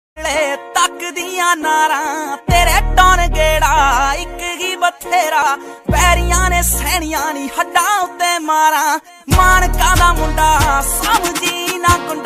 punjabi song bgm ringtone

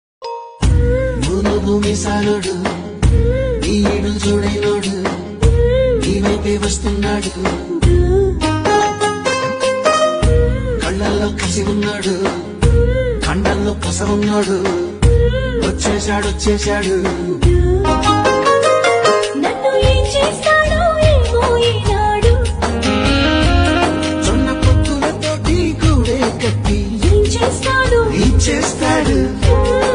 movie love bgm ringtone; yamadonga; ntr priyamani mamatha mohan das

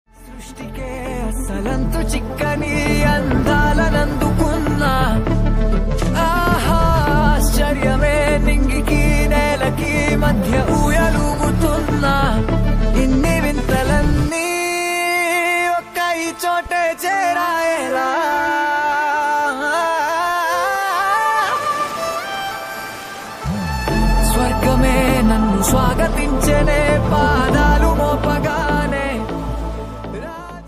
song bgm; Kalki; Prabhas

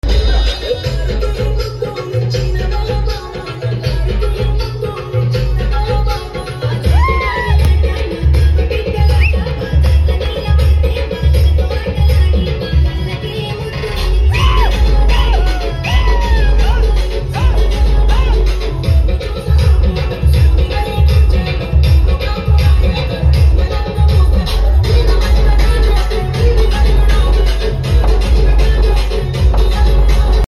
folk songs

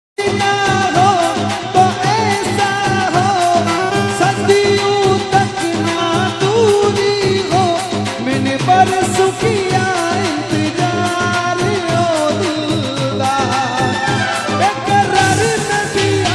rajasthani ringtone

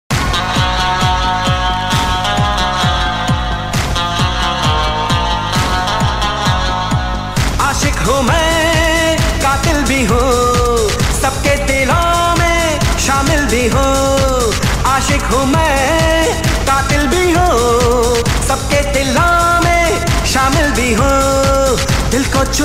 hindi song ringtone, old song ringtone, 90 s song

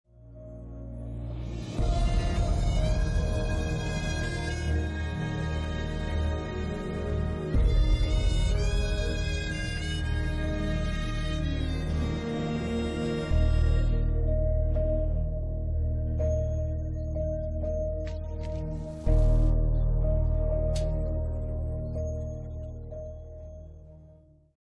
emotional bgm; balagam; priya darshi; kavya kalyanram; venu yeldandi; dil raju

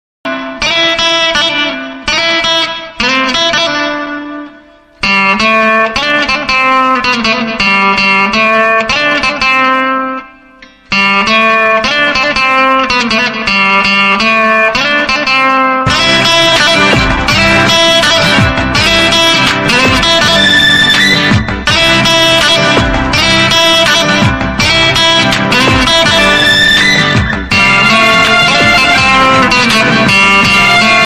odia ringtone; new odia ringtone 2023

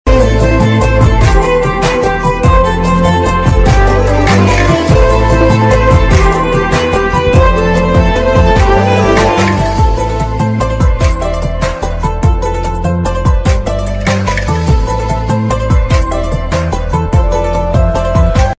bgm ringtone

